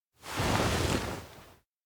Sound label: sofa lie; anemoi